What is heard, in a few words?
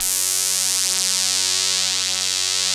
700 F#2, Korg; 700S; Back In Time Records; Korg Universe VOL-1